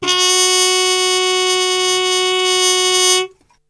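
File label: F#2, Brass, trumpet harmon mute; Best Service; Brass Super Section; TRP HARMUTE; 90 s Sample CDs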